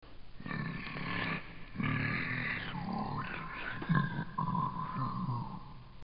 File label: zombie; dod